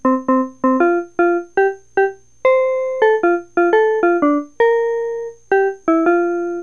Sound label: Marseillaise